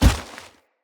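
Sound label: Heavy Grunt Boot Walk Vegetation, Footsteps; Enemy; Sinecure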